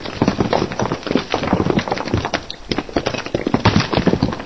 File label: Rocks; 暴力赛车